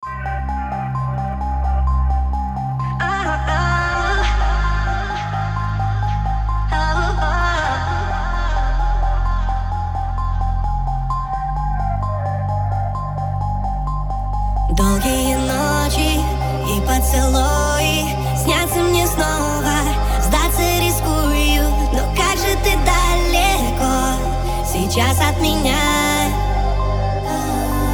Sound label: Phonk Remix